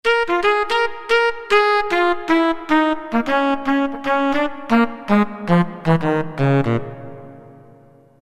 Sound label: Sax 2; Roland MT-32